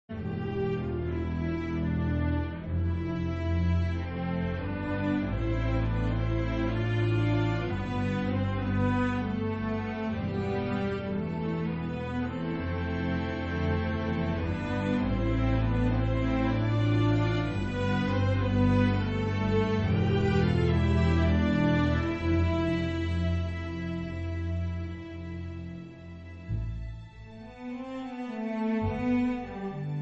String Orchestra Cover; Stormlord

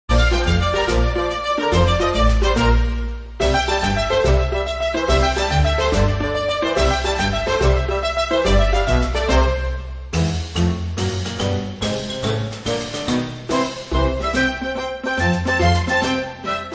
Orchestral Remix; Viz

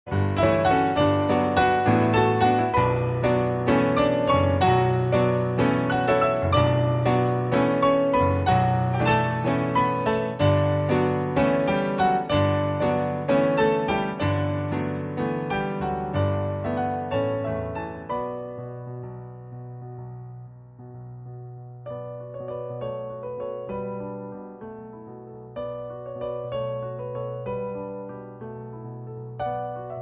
Pianos

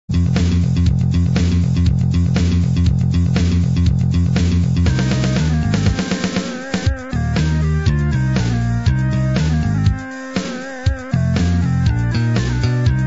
Ska

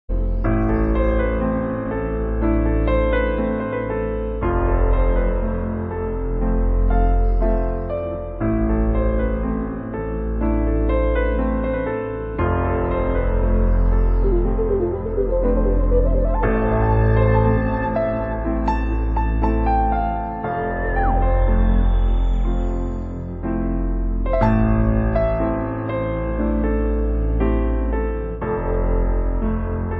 piano on outer space sounds

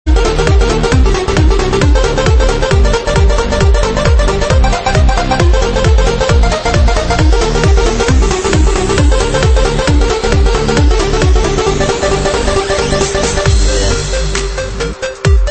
Dub Bach Trance Mix; Space Harrier

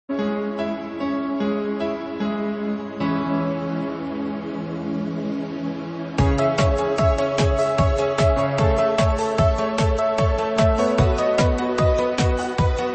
Arpeggio

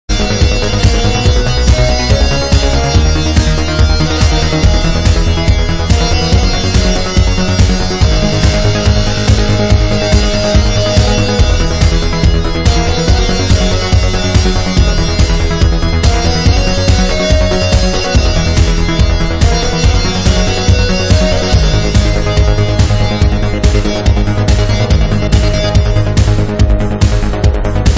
Distortion Remix; Great Giana Sisters; Pedal To The Metal

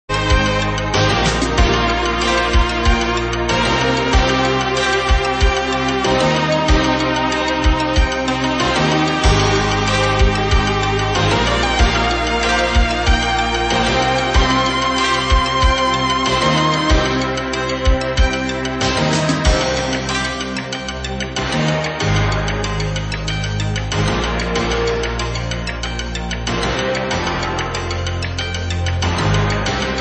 3 Chn Gametune

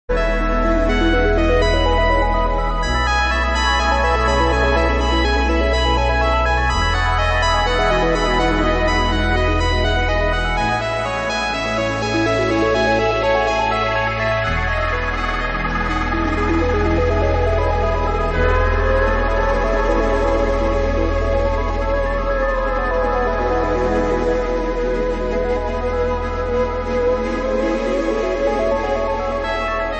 Ambient Cover; Led Storm

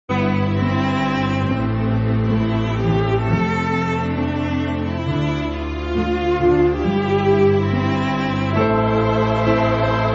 Orchestral; Spy vs Spy

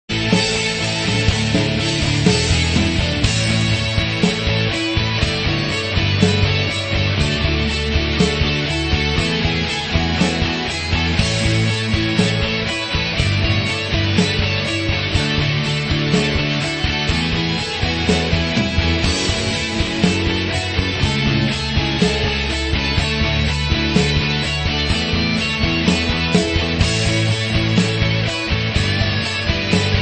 game over tune; Commando

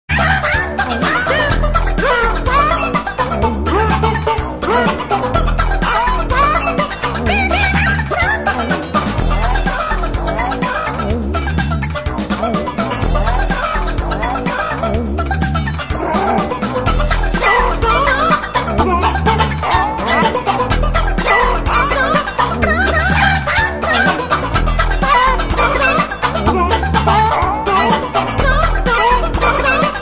Funky

